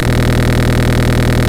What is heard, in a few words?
loop m61 bass, guns tanks